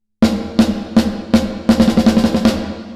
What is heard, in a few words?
Reverb Compare Snare